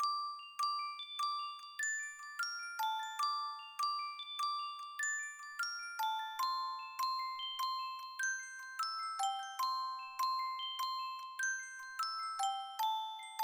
Bells, Dmin; 075 Dmin

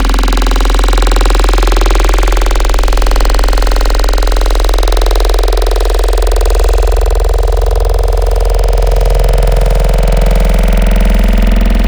Growl Filter, Wavetables; Cymatics

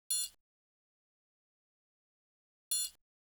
Triangle, 092 Cmin; Hip Hop Exotica; Apple Loops; Big Fish Audio

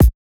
Kick Hit

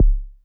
Kick, Hip Hop

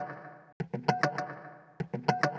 Guitar, Emin, Hip Hop

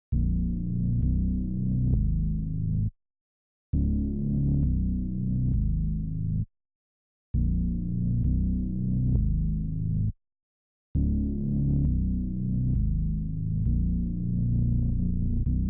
133 BPM D# Min Bass; Cymatics; Utopia; Destiny Gold Expansion

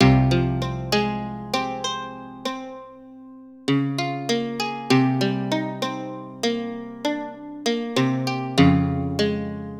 Mandolin; Hip Hop Exotica; Big Fish Audio

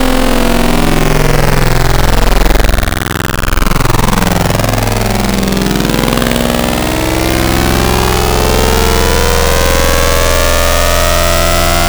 Additive Mess, Wavetables; Cymatics; Wavetables Collection Vol 3; Serum

